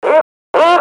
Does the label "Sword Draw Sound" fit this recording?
No